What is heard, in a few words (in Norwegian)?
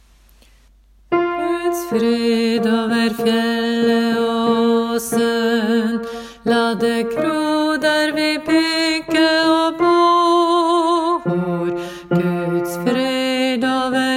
korpsversjon bass; nordnorsk; åfjord